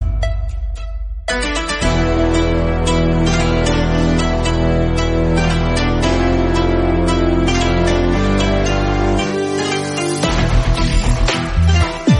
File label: theme bgm hindi; kgf2